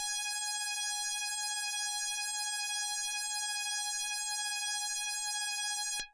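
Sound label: Electric Bass DX100 G#5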